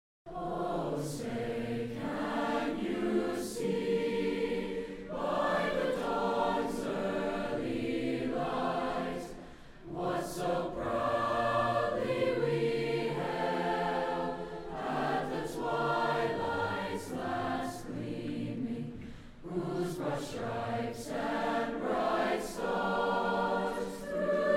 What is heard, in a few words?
HIGH SCHOOL CHOIRS Holiday Concert; SENECA VALLEY; STAR SPANGLED BANNER; F. Scott Key